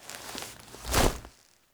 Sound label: Queens Chamber Foley Queen Scepter Swipe; Warframe; The War Within